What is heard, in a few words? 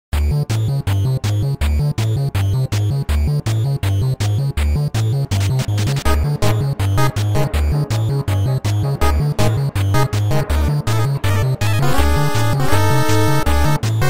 8-Bit Battle Theme; Mollusque-Lanceur; Super Mario Odyssey